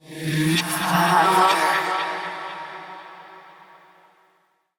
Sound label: Hand Compass Vocal; Warframe; Duviri